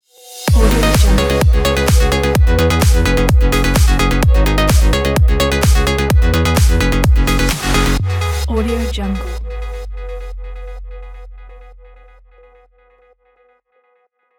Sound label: electro house logo